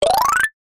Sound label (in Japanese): 場面切り替え 木琴 上り 遅め; 場面切り替えや心理描写; 演出効果音